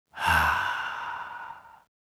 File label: red Panda Free Spin Outro Sigh; netent